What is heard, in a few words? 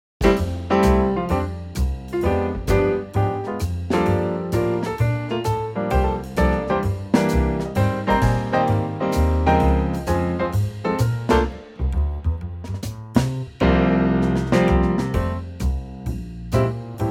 F Trio